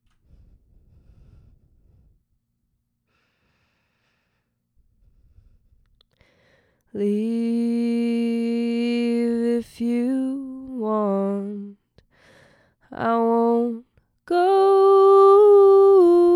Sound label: vox